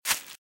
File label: Velociraptor - Footstep - Grass - Run - Left; RadwinIsland